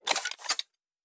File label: Weapon Reload (Rocket Launcher); Sci-Fi Sounds